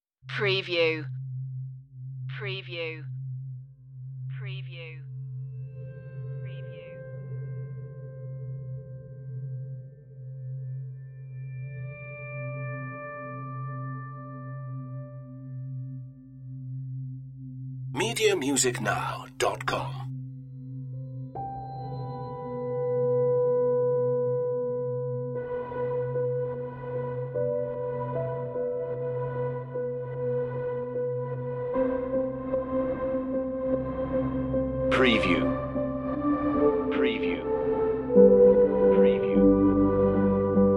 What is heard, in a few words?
ambient dark hope